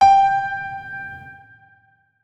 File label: notes, keyboard piano; Hideout Furniture